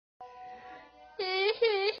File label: ihihi